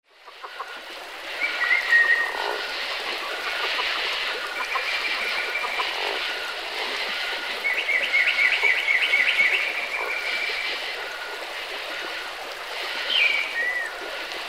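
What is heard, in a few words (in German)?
Vögelzwitschern, Naturklänge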